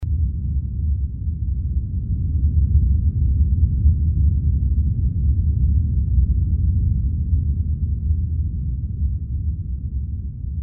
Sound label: fx rumble; roland mvs1